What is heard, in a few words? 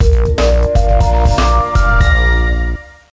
Win; mechanic master 2